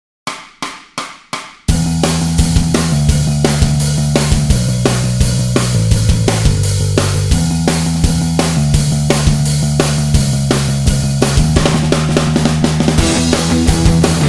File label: backing